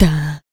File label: HIT, Jazz FX FEM PERC